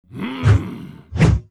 attack; paimon

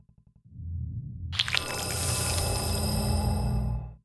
flesh sweep

